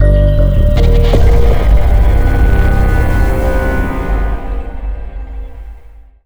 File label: Fail Myriad; starfront collision; android ios macos mobile